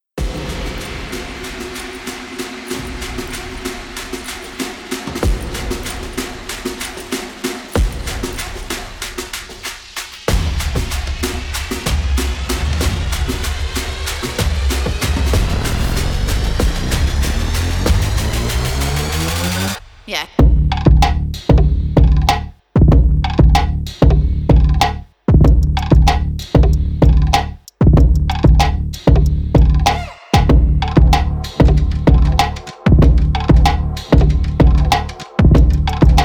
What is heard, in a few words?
drive breakbeat